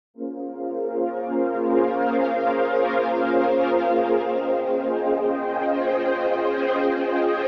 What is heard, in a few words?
pad; Pads; TRUTH-HZ; Crowdsourced Sample Pack